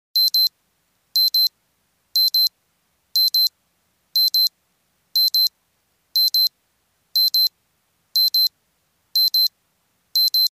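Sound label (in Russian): будильник электронные наручные часы сигнал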